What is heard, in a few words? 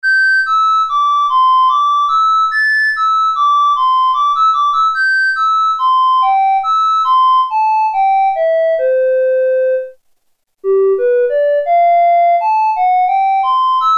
RECORDER, Oberheim OB-8; Factory Patches